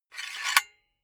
silicone gun empty pusher pull out fast; soundpool

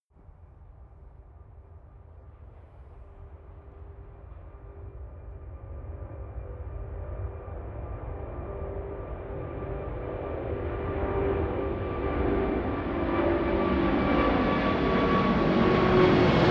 plane flyby land; flugzeuge verkehrsflugzeuge; verkehr transport

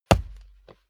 step barefoot wood parquet single trample; Footsteps; schritte

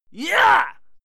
male fight scream yah short; soundpool

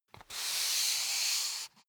step barefoot wood parquet slide long; schritte; Footsteps

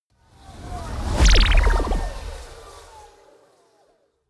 magic warp personal jump wobble; swooshes-swishes

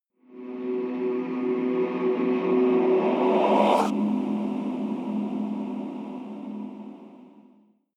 evil choir transition, risers

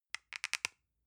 lotion lid open soft; berufe; friseur

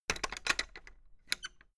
fence gate latch rattle hard; objekte; tueren

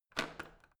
washing machine creaky rotary button quick; haus garten; haushalt

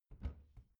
bathroom cabinet door creak open soft

